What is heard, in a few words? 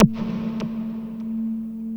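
wall moog, bass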